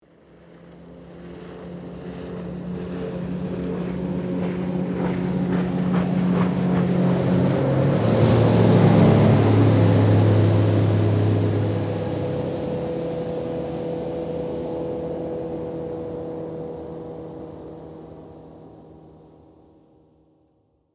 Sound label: Motorboat passing by close perspective